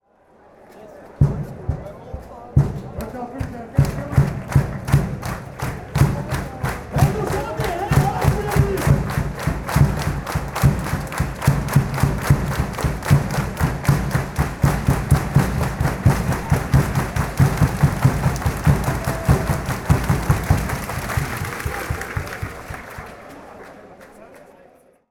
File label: claps